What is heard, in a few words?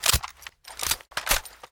tiny mg reload; Original TK Sounds